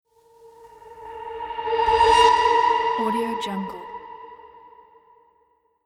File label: bowl swell